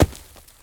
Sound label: gravel place; everness